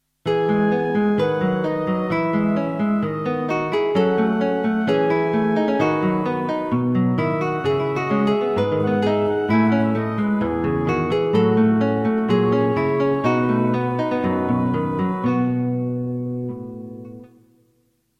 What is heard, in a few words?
nylon strings, Intermezzo met akoestische gitaar en fluit; AG fusion